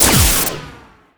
Scifi Forcefield Impact; Energy & Forcefields

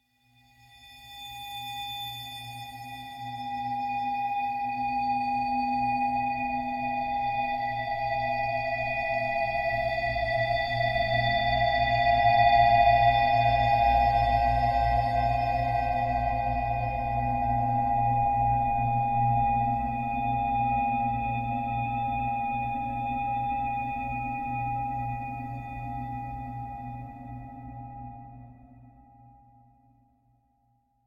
Drone Metallic Harsh Feedback Scary Pad; Hollywood Edge; sound designer's toolkit v2

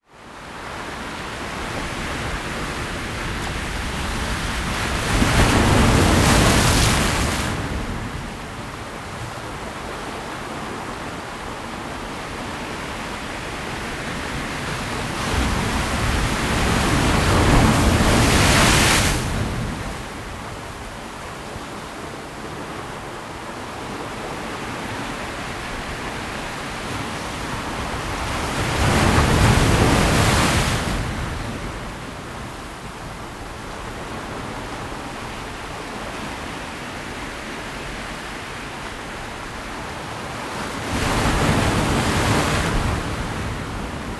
sea waves; best service Blue Box; sea ocean

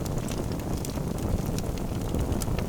Fire Torch Loop; Destruction

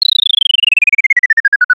Arcade Chirp Descend, Video Game Sounds; 1001 Sound Effects

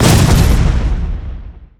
Explo Energy Fireball; Destruction

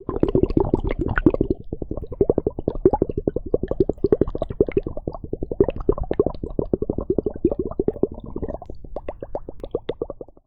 bubbles, under water; liquid; NS Studios - ultra sound collection

